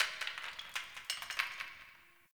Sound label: Brick Crumble; Warframe; Ambience; Nineteen Ninety Nine